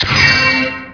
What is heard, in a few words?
CAR POWERUP